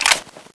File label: clip out; tfa cso; m16a1ep